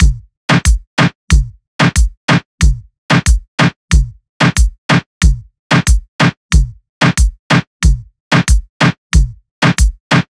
Drum loop, 95 BPM, Latin; Astro

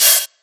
Open Hi Hat, Dubstep Drum Shots

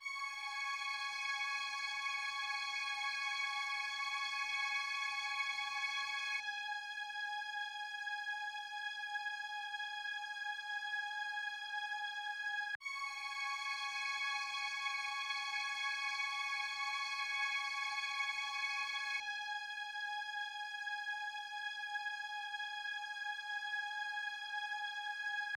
150 BPM C# Min Strings; Cymatics; Code Of Honor

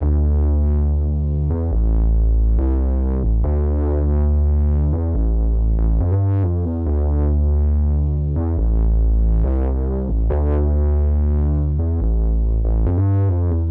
OB-6 Bass, 140 BPM A Min; Stems; Cymatics; Manifest

